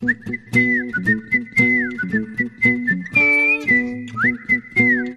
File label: Dance Whistle, Sting